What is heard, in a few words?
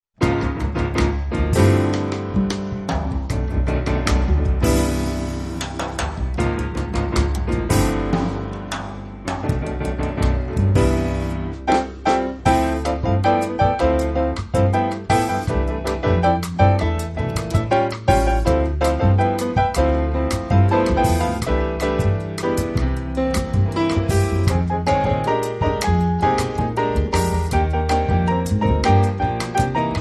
samba jazz trio